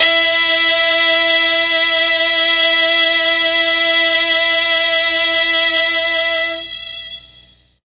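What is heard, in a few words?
E3 string brass, mellotron; STRGS+BRASS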